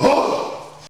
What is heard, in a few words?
hit; freak fortress 2; aniki